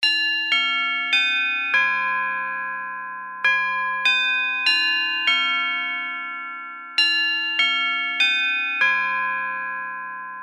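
household clock mantle no tick tock strike 12 bell westminster chime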